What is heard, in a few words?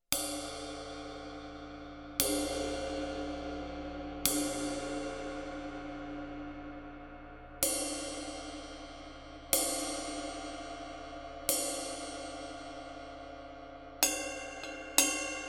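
ride ZILDJIAN K CUSTOM 22, CYMBALES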